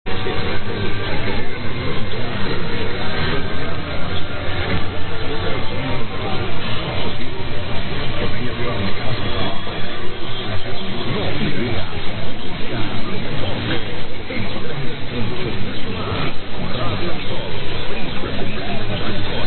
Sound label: Radio El Sol Colombia; 1550 HJCB